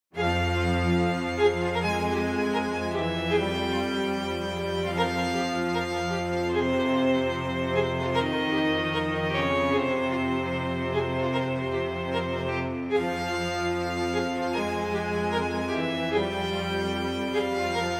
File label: short cinematic music; instrumental and cinematic music for film game